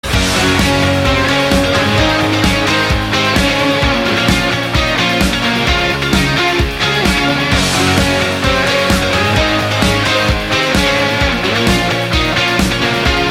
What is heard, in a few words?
Upbeat Party Rock, ringtone; MIUI12